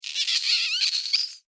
Insect Voice Cry, Insect, Voice, Cry, Pain, Emotion, Vocalization, Bug; Blastwave; Animals:Insects